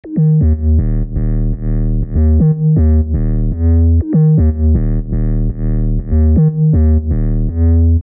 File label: BASS Ebm 120; UNISON